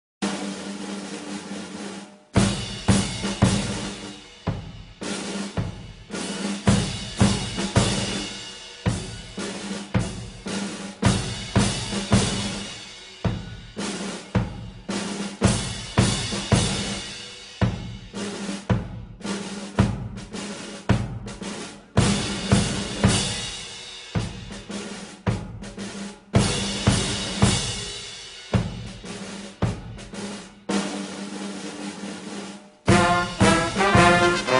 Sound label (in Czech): Narodni hymna Filipin instrumentalni